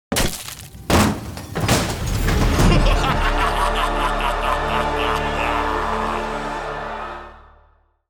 Suspense logo intro effect ident; halloween

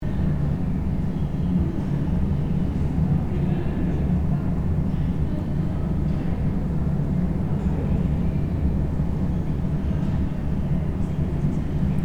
School ambience empty classroom tone loop

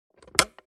Cassette tape recorder push stop button

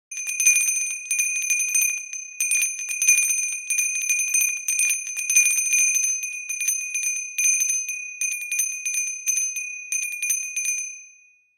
Small handbell ring long version

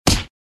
Heavy body hit

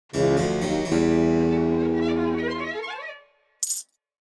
Mobile game spooky mystery reward

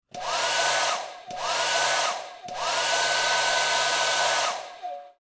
Travel hair dryer on and off multiple times

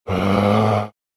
Zombie idle moan

